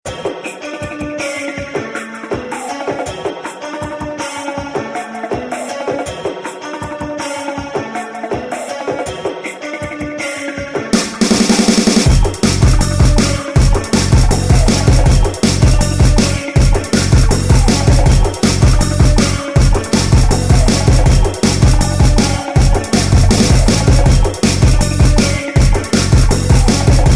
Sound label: break beat; hip hop